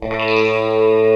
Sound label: DSP; OMI - Universe of Sounds